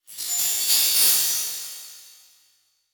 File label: Title Transition Glass Element; Production Element